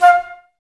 Flute, Woodwind; Miroslav Vitous - Woodwind Brass Ensemble

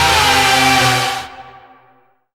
RAVE, RAVESYN

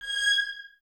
Violins Detache G#6; Miroslav Vitous; 11 Violins

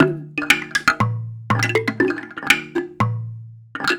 Whale Bones, Ethnic Instruments; Sonic Foundry (Sony Creative Software); The Electronic Music Manuscript Collection

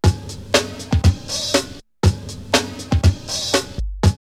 Brakes & Beats 120, drumloops OldSchool; X-Static Goldmine

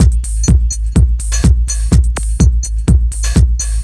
direct beat, Deep House, 125bpm

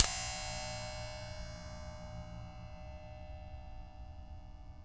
Ride Bell, World Percussion One Shots; Sony CS

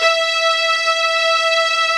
SPARCO VIOLIN

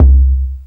Kick, Drums Percussion; Zero-G; Total Drum Bass

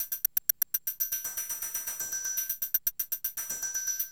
ELEC HH 120; AMG; Global Trance Mission VOL-2; PROCESEDLPS

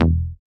BAS, Dance Bass

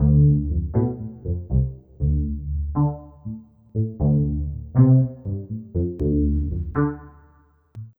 Smooth Frets, Bass; Sonic Foundry (Sony Creative Software); Synchro Funk

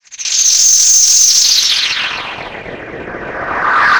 Static Riser

